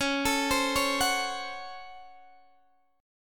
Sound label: C sharp Major 7th Suspended 4th Sharp 5th, Guitar, DADGAD